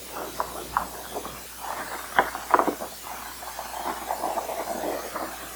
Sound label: Turtle Shell Breaking; turtle nests